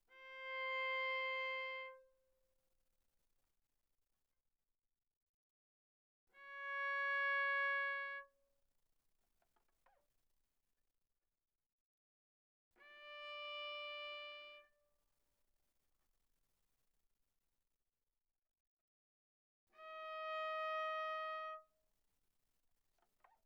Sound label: Viola arco sul D mf C5 B5